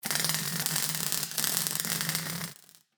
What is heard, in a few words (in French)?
soudure; nucleaire